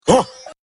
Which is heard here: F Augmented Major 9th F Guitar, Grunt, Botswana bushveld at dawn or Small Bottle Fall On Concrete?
Grunt